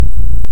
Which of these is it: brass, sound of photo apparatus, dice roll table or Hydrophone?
Hydrophone